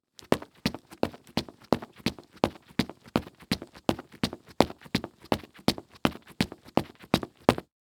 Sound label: Foley Footstep Golf Cleats Run On Cement; Foley Footsteps